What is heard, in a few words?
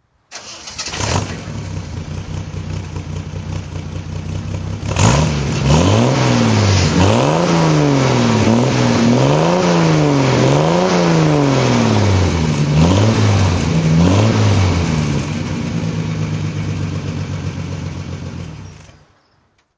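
TR6 PI throttle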